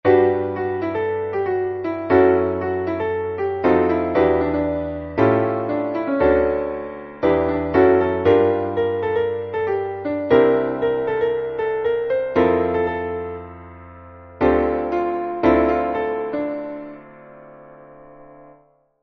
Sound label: chorus